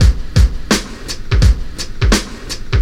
85 bpm breakbeat sample c sharp key

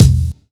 kick one shot e key; tuned drums e key

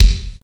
crisp kick sample g key

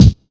clean kick drum single shot g sharp key

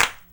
smooth clap g key; snare drum alternatives

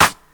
rap hand clap sound g sharp key

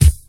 rich top end kick single shot D# key; kick drum

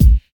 rich top end kickdrum sound a key

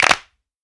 wet hand clap e key; percussion; single hits one shots

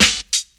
trebly acoustic snare sound b key; snare drum samples

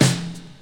fluffy snare one shot E key

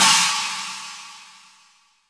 crash one shot c sharp key

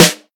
raw snare single hit f key

